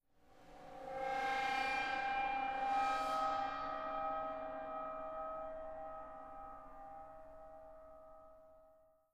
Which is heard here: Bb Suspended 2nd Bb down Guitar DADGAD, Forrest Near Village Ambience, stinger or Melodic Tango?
stinger